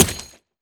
frag physics bounce mud hard; gmod; viper

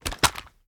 reload empty end; gmod; viper

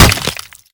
bullet flesh head; gmod; viper

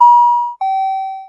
out of fuel; gmod; svmod

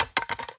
phys mag drop ak metal concrete, magazine drops

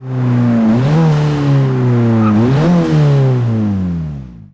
throttle off, vehicles; gmod; tdmcars; for focus rs 16

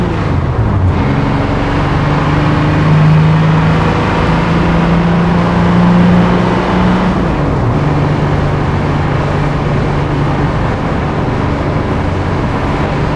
fourth cruise, vehicles; gmod; tdmcars; transit

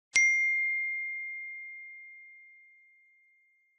note, phone; gmod; paris

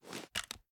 sm uzulu reload empty scope tilt; reloads; iw8; gmod

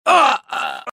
hurt, npc male; gmod

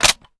reload fast mag mag in; gmod

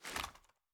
ar ads down; viper

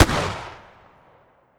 shotgun sup fire atmo ext; gmod; viper

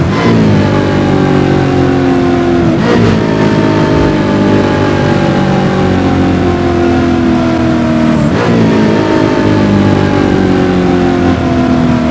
throttle off fast, vehicles

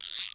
wrench, repair; gmod; svmod

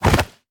melee attack fist; viper

